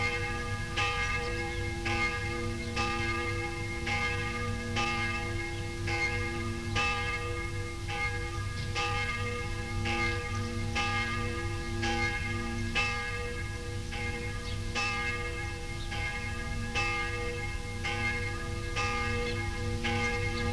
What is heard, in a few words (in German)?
Glocke Brenndorf